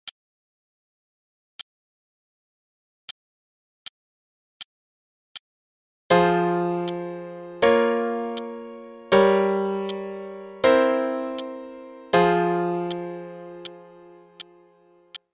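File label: f minor Chord Progression only (quarter note=80); CM Level 5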